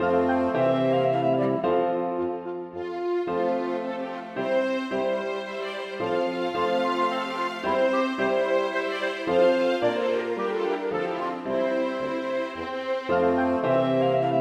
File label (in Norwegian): Menuet for to fioliner og lite ensemble; Aamodt